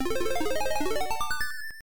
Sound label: Secret Unlock; Relevos Snowboarding; MSX; Alternative 2, Unused